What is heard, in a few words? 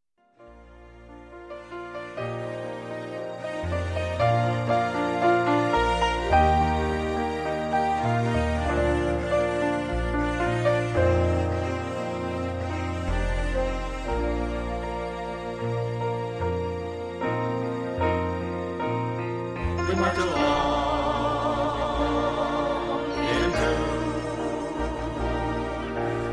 Anthem short version; UU Anthem